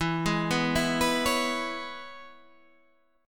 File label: C sharp Minor 7th E, chord